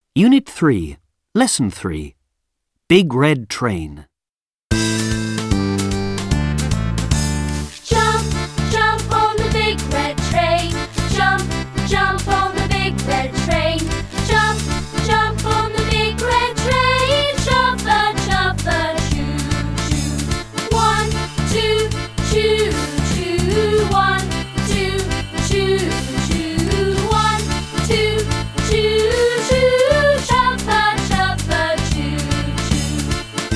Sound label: Big red train